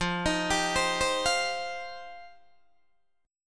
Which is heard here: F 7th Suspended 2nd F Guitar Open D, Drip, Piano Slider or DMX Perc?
F 7th Suspended 2nd F Guitar Open D